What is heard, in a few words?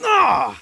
human male surprise; cs16; cstrike